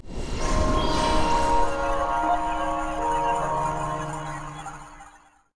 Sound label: Success General Hit; Assassin's Creed Bloodlines; psp